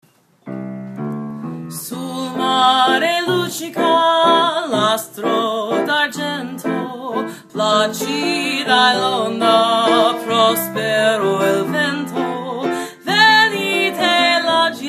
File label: Italian Singer